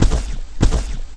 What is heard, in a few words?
Walk; Seer; GamigoNA